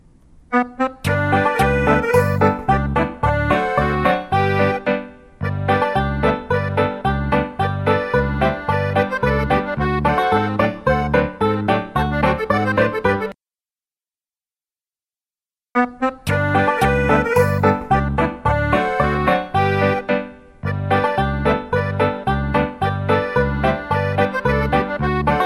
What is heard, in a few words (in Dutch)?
karaoke versie; geef dat doosje door